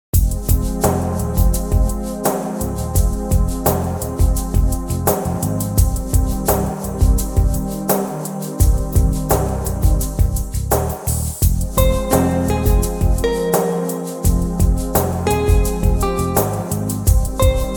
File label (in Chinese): 纪录片背景音乐